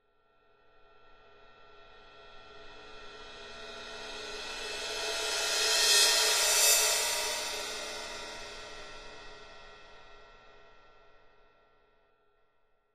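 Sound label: Cymbal Thin Crescendo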